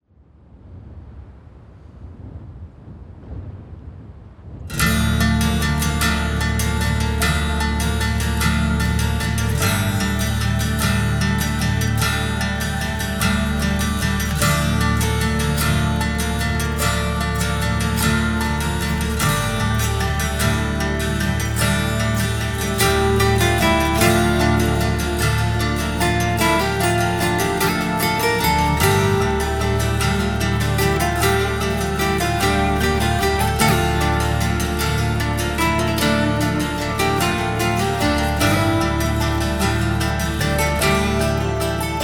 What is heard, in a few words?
(winter) (instrumental acoustic bonus track)